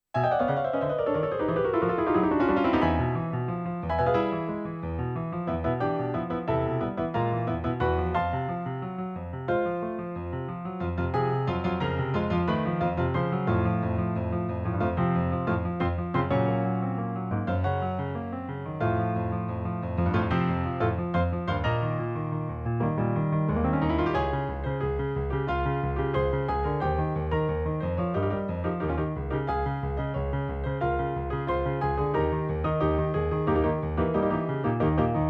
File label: Trainer Piano; Cat333Pokémon